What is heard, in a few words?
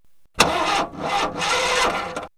Cold Cold Start; X-Static Goldmine